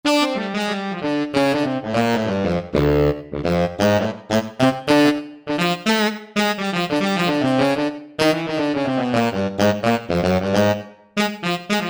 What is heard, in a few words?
Saxy; Generalmusic Equinox